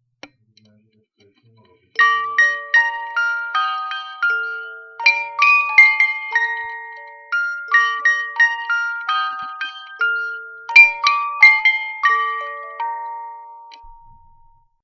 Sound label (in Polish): Pozytywka Old McDonald had a farm